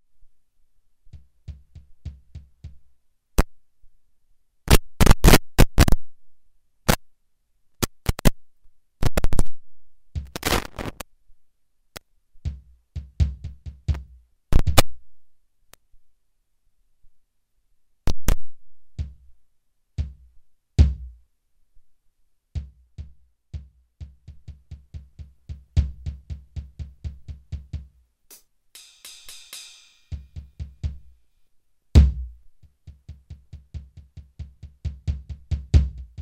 Mountain Music Electric Drums

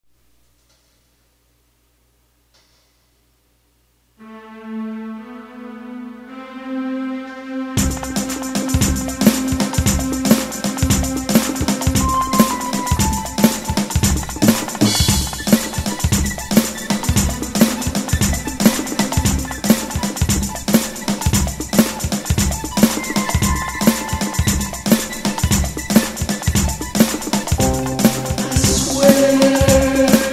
Acapella

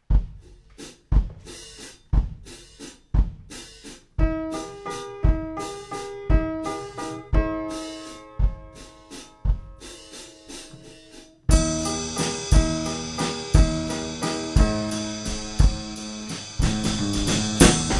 waltz

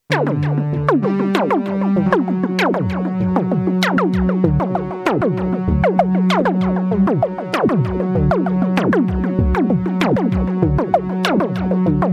Remix

